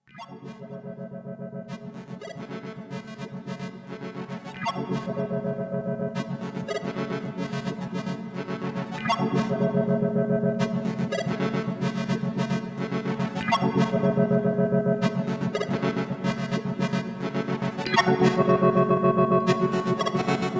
glass arcs, music

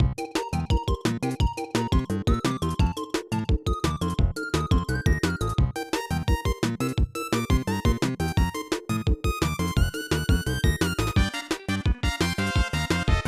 mini game; kdl3

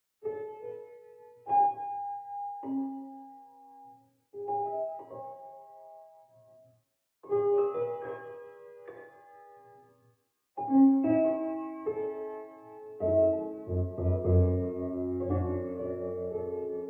dry hands piano; minecraft

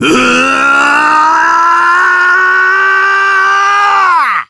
uwaaaahhh; Battler

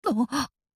gasp; Beatrice

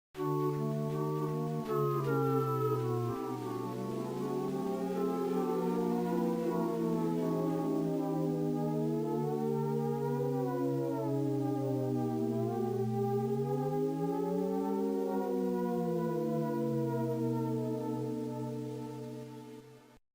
carrier pigeon, music; mother 3

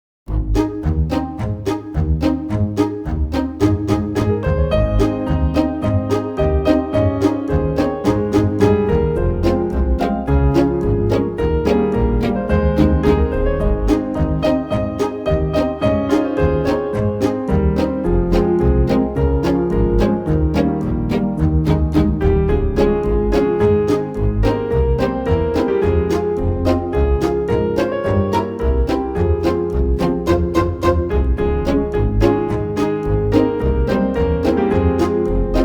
vn bgm, air pizz; higurashi kai

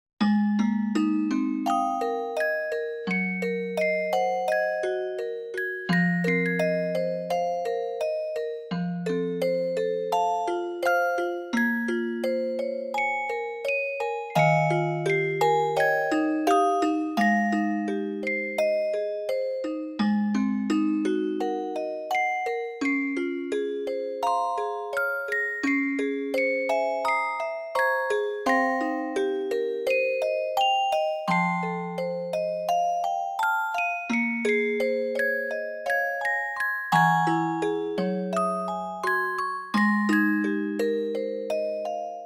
visual novel bgm, daily passing by celesta; higurashi kai

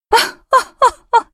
laugh; virgilia

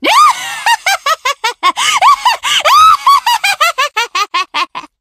laugh; Beatrice

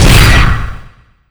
hit

